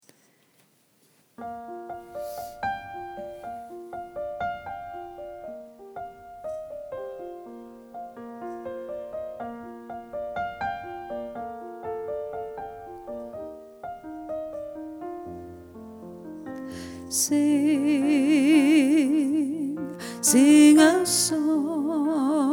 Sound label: Sing Sop